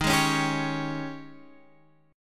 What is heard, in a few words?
G sharp Major 7th Suspended 4th, D sharp